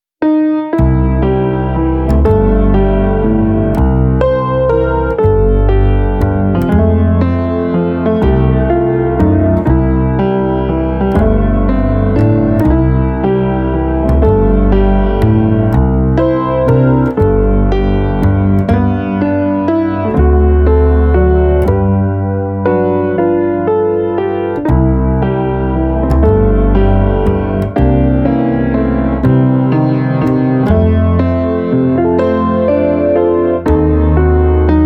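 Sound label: 60 bpm, Walz, blues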